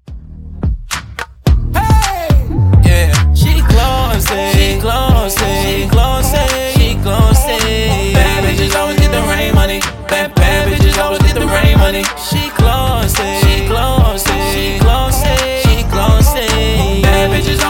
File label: Dj Intro Outro 108bpm; remixplanet